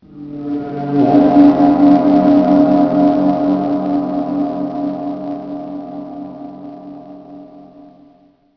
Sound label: big ring; AMBIENT; Pirates Of The Caribbean; INCA TEMPLE